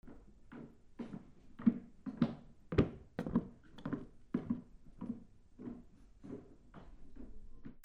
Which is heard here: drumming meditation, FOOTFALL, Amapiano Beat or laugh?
FOOTFALL